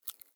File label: Foley Mouth Move; Enemies; Warframe; Catbrow